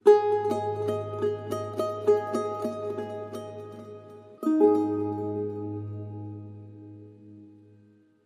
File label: Charango